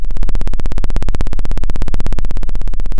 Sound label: Sound of a plane taking off